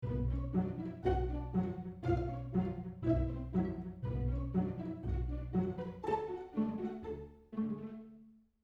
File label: Hall of the Mountain King, pizz; Hollywood Diamond